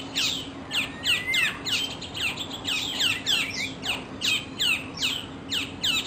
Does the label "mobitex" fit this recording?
No